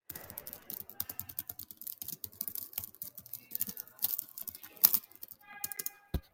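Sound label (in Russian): клики мышки при игре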